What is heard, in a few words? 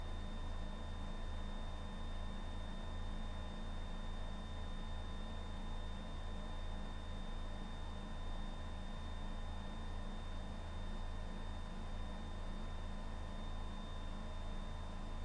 train passes announcement movement metropolitan line